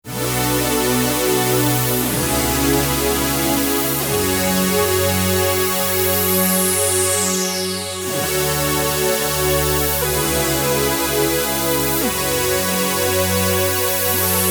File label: Poly Glide; Lightwave; dinopark